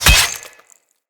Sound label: Melee Axe Impact Flesh